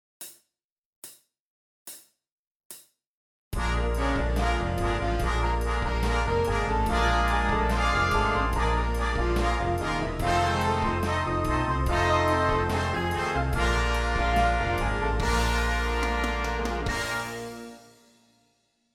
Rhythm Study

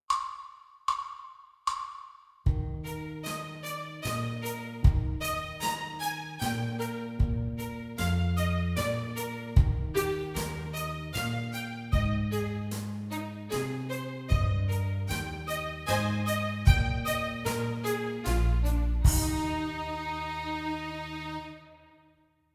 Waltz

